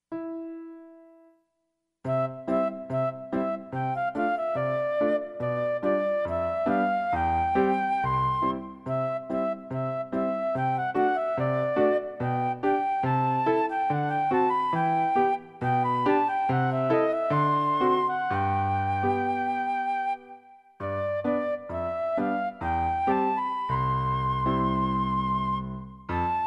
Heidenroslein (C, low) piano acc.

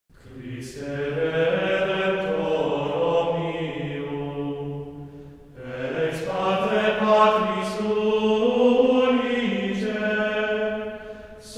Hymn; Christe Redemptor omnium

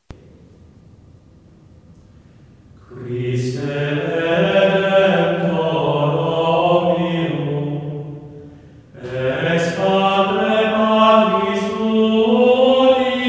hymn in fauxbourdon style; Guillaume Du Fay; Christe redemptor omnium

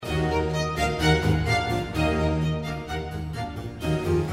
Violin Concerto in A minor Presto; Antonio Vivaldi; Op 3 No 6 from L'estro armonico

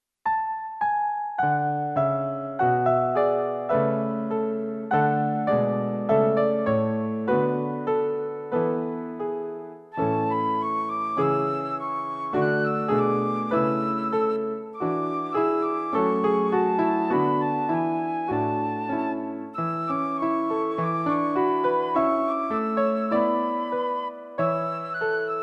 A, high, piano acc.; Genteel Love

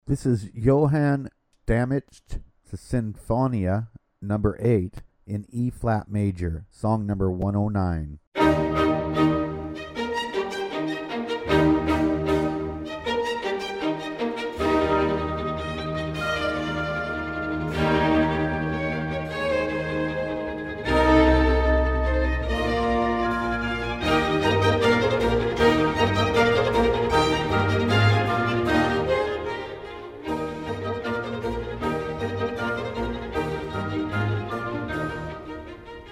Sinfonia No8 in E-flat Major; Johann Stamitz

